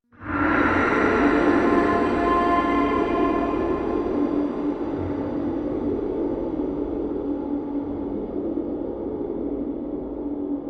Ghostly Voice Musical Fantasy Crash Reveal, Ghostly Female Voice Musical Crash Reveal; Crash Cymbal; Horror Long Fade; Ghosts